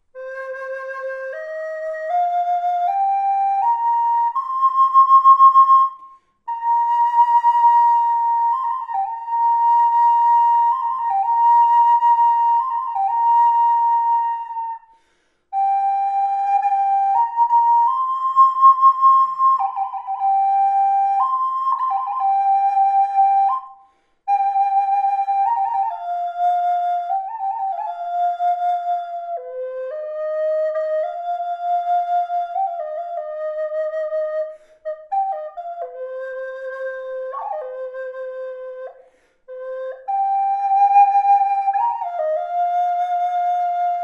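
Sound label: reverb, flute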